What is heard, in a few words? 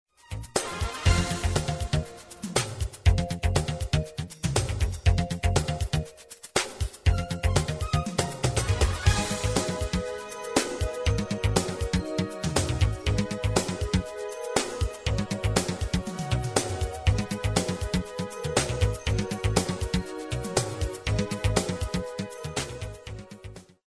pop dance